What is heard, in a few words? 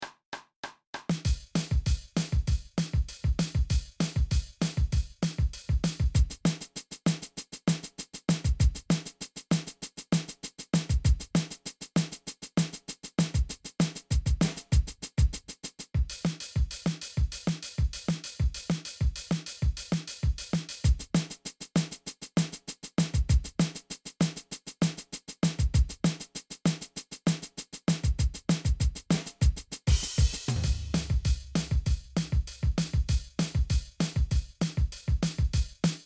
gimme drums